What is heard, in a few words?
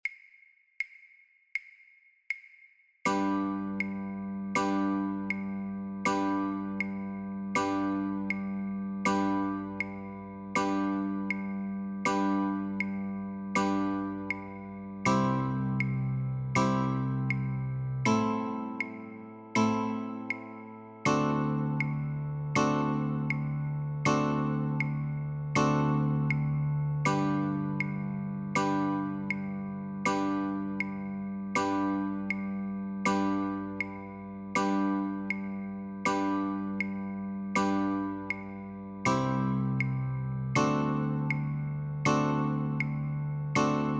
Chords only, guitar